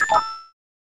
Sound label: Buzz In; Jeopardy; snes